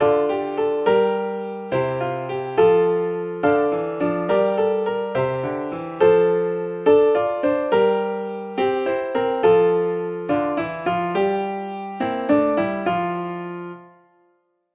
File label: accomp; Glory To God; Taize; hymnary